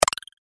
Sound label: beep; cstrike